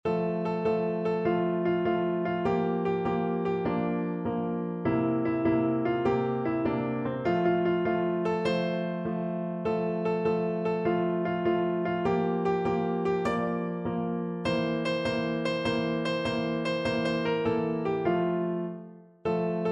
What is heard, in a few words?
one potato piano